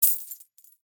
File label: Coins; SNKRX